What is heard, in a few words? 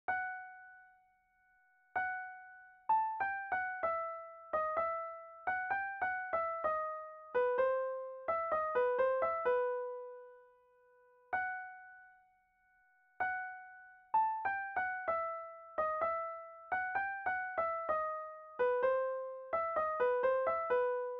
melody